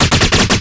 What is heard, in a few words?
wand burst shot strong loop